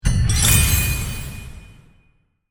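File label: get Reward; music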